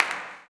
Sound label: CLAP, blues & soul; ILIO; Vocal Planet